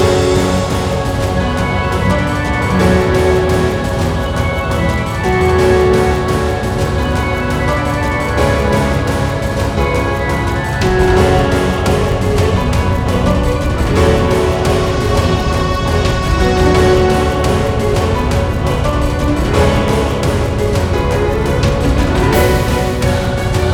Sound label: Loop; Lost Epic